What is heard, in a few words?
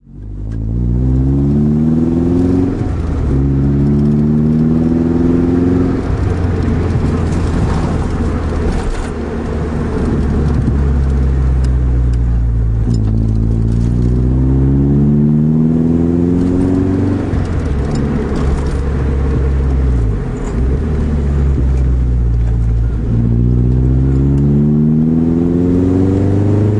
muffler sound